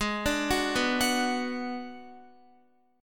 Ab Minor 7th Flat 5th Ab, down, Guitar, 4E, 4A, 5D, 5G, 5C, 6F; Abm7b5; arpeggiated